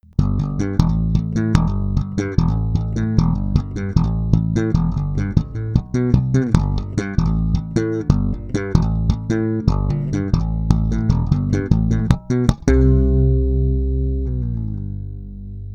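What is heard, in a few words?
White, bass nylon tape wound; La bella; Rotosound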